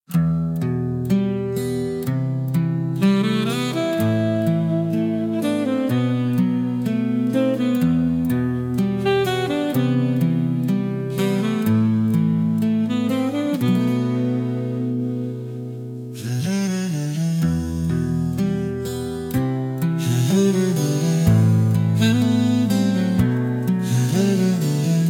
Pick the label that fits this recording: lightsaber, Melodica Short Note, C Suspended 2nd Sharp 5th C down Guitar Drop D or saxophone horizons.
saxophone horizons